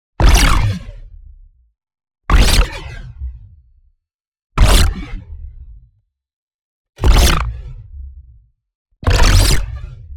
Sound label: NEON ZAP Plasma Tail; boom library